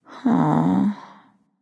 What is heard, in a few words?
nv sigh; smite; awilix